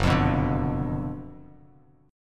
F sharp Major 7th Suspended 4th Sharp 5th, F sharp, down, Bass Standard; F#M7sus4#5 strummed